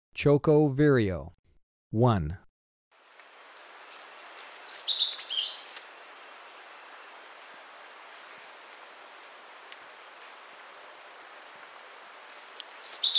Choco Vireo, Birds of Ecuador; S. America

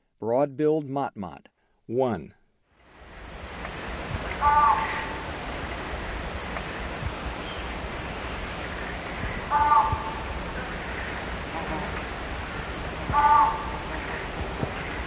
Broad-billed Motmot; S. America; Eastern Lowlands

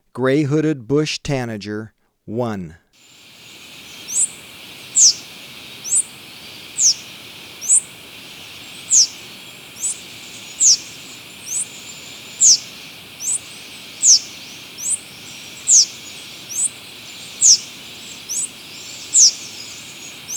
Gray-Hooded Bush-Tanager, Birds of Ecuador; S. America

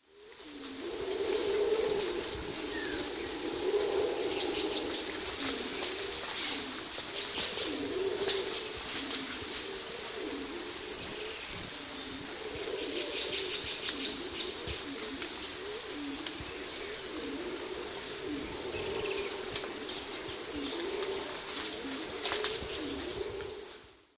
Epinecrophylla erythrura, call; S. America; Eastern Lowlands

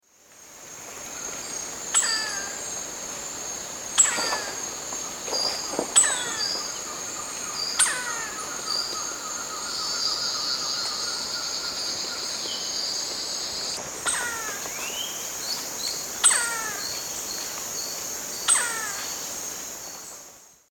Campephilus rubricollis, call; S. America; Eastern Lowlands

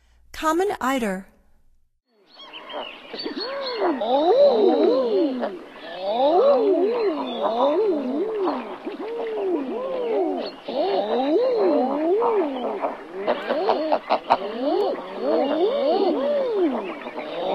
Common Eider; North America; Stokes

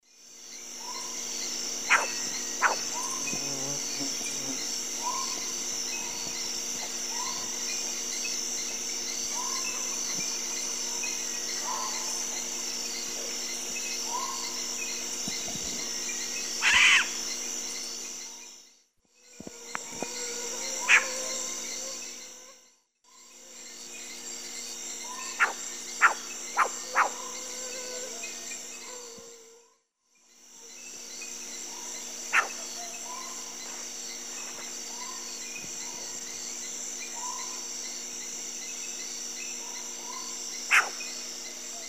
Asio clamator, calls; S. America; Eastern Lowlands

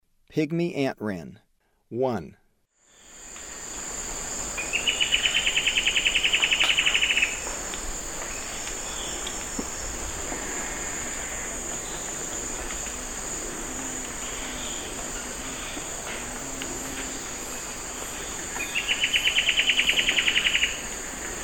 Pygmy Antwren; S. America; Eastern Lowlands

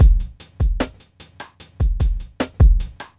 hiphop

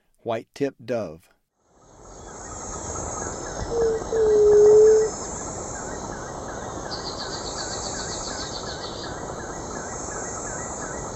White-tipped Dove; S. America; Eastern Lowlands

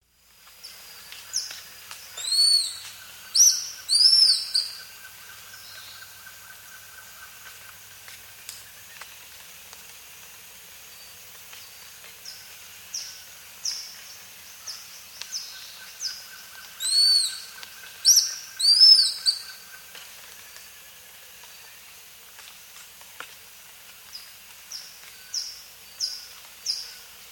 Double-Toothed Kite; S. America

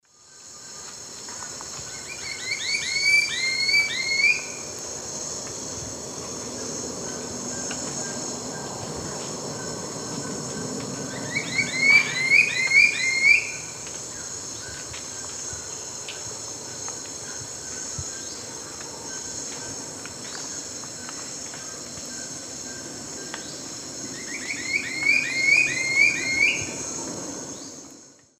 Campylorhamphus trochilirostris, song; S. America; Eastern Lowlands

